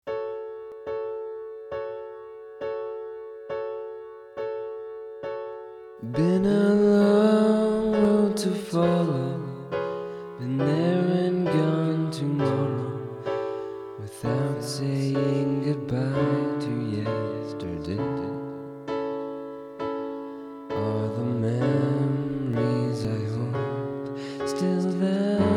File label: Piano